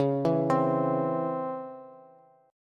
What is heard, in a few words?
Db Minor Major 7th Db Bass; DbmM7 arpeggiated; JGuitar